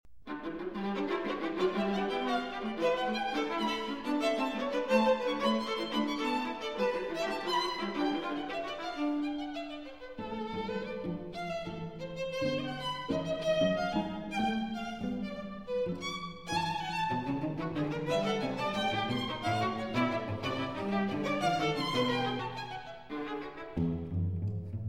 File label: String Quartet, Allegro molto